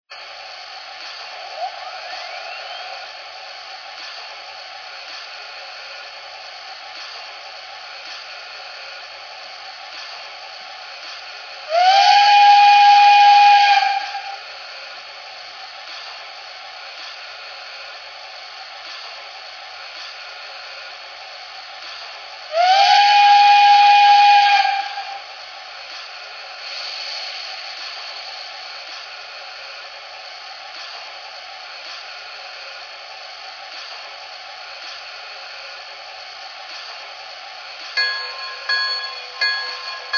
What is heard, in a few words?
usra, trains; 060